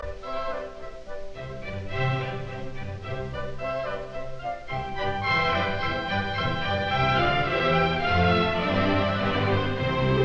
Suite in F major, Allegro; Handel; Water Music; arr L Stokowski; Leopold Stokowski

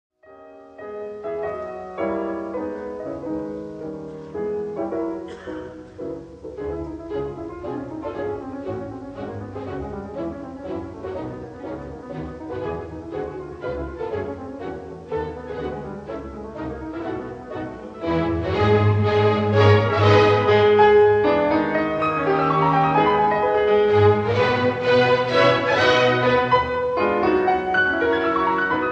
Piano Concerto in A minor, Allegro vivace; Schumann; Op. 54

